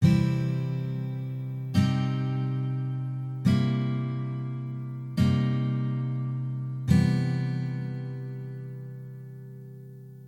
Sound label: harmony, guitar